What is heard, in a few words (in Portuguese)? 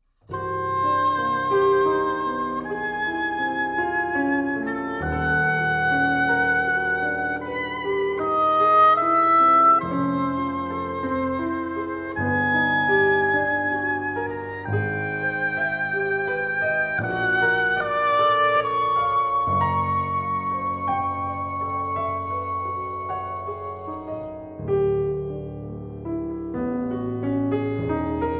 Sonata Para Oboé e Piano I.; Luar Caiçara